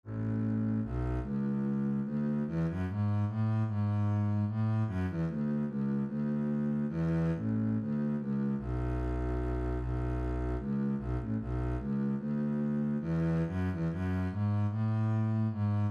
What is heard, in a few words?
minuet bass